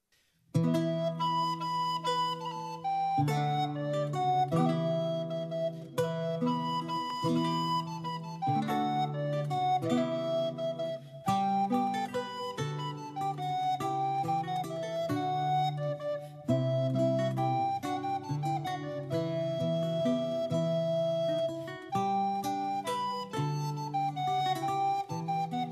Baroque Ukrainian love song

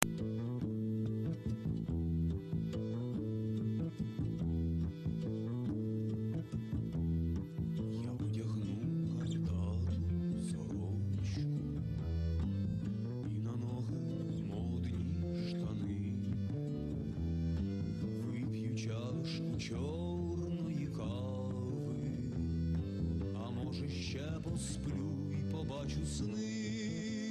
song by Ukrainian music group

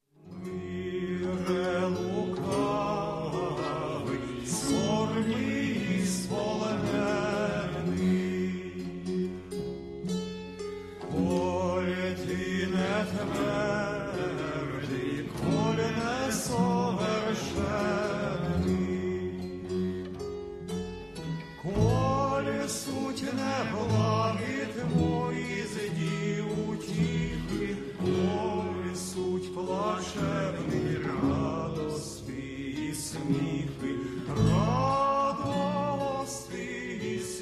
Ukrainian baroque song

